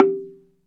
Slit Drums, Africa, Wood & Metal; Roland LCDP11 Africa VOL-1

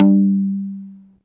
T.BASS2 G2, TAKK-BASS, Yamaha VP-1; Music Store

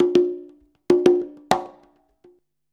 CONGA, Modern Percussion Loops

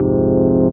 Fm Dub Chord; Electronisounds; Enigma Dub Cube One Shots

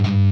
POWCHUG, Guitar & Bass; 90 s Sample CDs; Quantum Leap - Guitar & Bass

